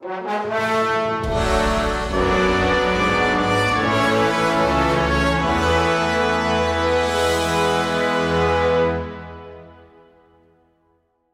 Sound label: Level Up Stinger